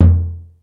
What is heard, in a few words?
BASSDRUM; East West; Global Sounds